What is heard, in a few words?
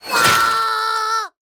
Wolf trap; Rabbids Coding